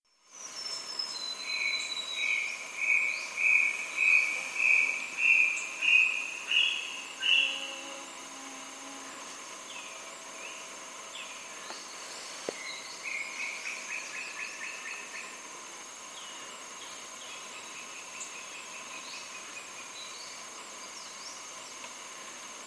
tataupa rojizo

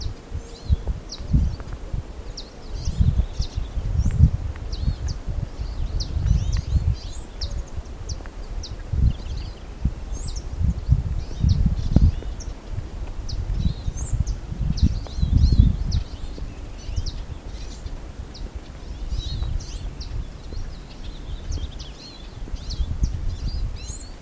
Cabecita Negra

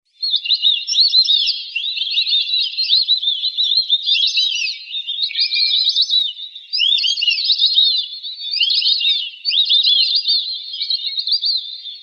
Siriri pampa en vuelo

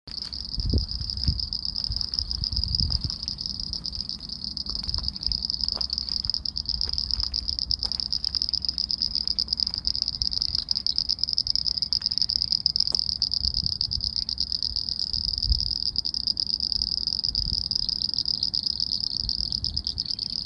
Ranita Enana Comun Pseudopaludicola falcipes

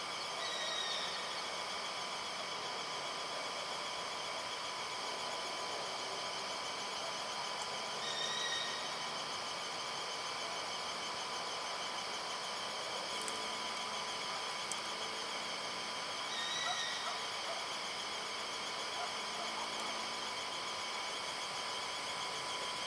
Asio ahora Pseudoscops clamator, eucaliptal; Belgrano y Libertad

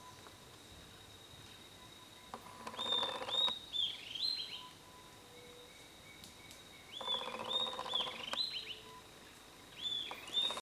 Mosquitero, Estalador (Corythopis delalandi)

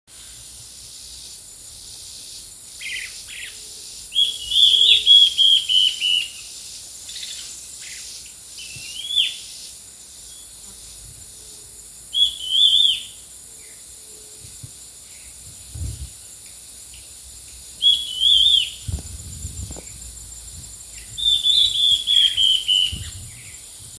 Batara estriado, Piu-piu (Myrmorchilus strigilatus)

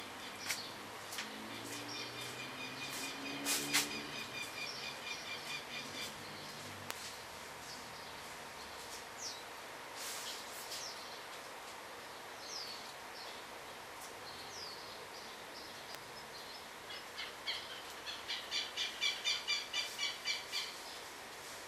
Teros; Chajari

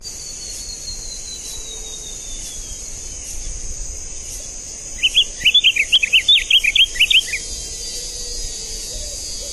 Cardenal Azul Solo

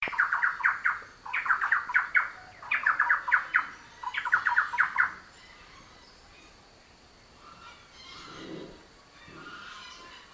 Ratona Grande, Catatau (Campylorhynchus turdinus)

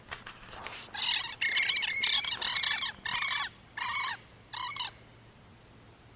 Tero

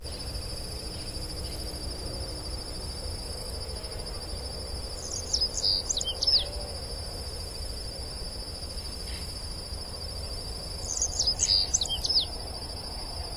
Cachirla Palida

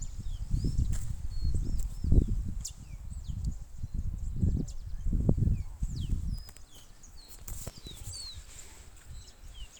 fueguero; Sanhaçu-de-fogo (Piranga flava)

